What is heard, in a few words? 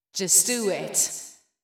VOICE GRL HOUSE 125 A, House Voice